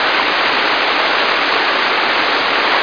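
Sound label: waterfall; tnpdemo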